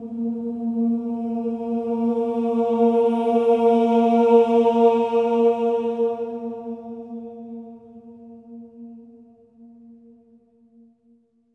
OH A#3, Symphony of Voices, SWELL OHS; Spectrasonics

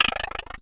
Percussive Hit, contact mic

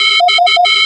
REQUEST, SIGNALS; AKAI Anime Sound FX

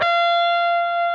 PRS F#5, guitars, lead; Spectrasonics; Hans Zimmer Guitars VOL-1

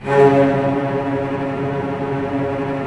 STR VCS; Roland - LA Composer vol. 4

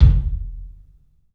KIK, LIVE CLUB KK, Drums; 3D Sound

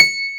PIANO C5; J.ROCK

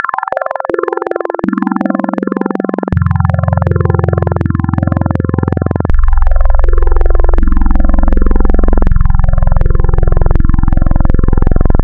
Add BinAdd Sqrs, wavetables Additive; Architecture 32

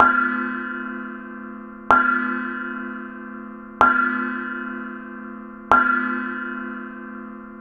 Nepal Cymbal 126 C; Percussion Elements

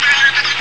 funk; Amiga Soundtracker Sample Packs

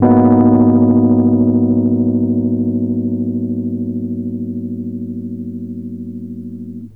Rhodes, Keyboards; Drum & Bass; Total Drum&Bass

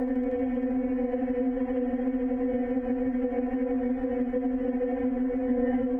Tonal Re Draw, sparse soundscape; Loops

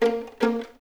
Violins A#3 Pizz; String Ensembles; Miroslav Vitous; 11 Violins

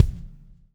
SLICK, Backbeat

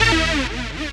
DR. LOOP, BUZZ; X-Static Goldmine 3

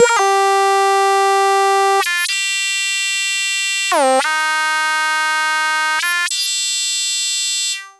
Lead, Synth, Leads; Sonic Foundry (Sony Creative Software); Electrocution

